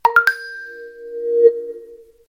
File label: nokia calendar; amber